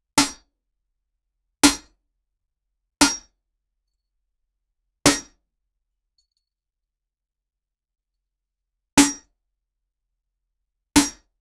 a metal bat hitting, blow

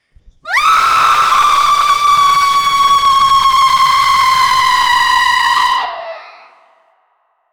a witch screetches ot lou

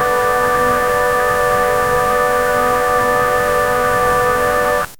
booting sound of a operating system

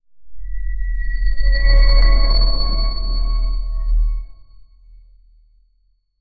a sonic depiction of a, celestial

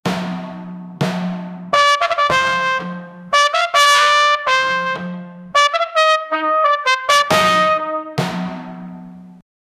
brass

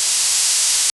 venomous plant hiss

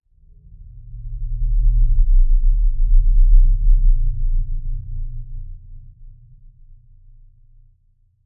low suspense tone

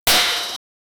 breaking block sound

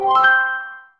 interaction

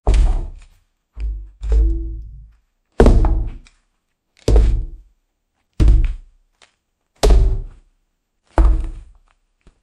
horror footstep sounds of, puppet

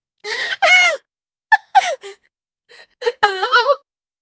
sniffle crying women

